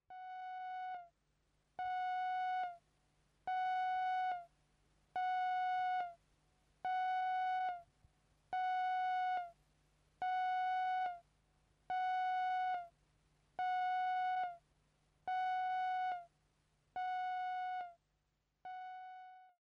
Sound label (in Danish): Pausesignal, Varslings udsendelser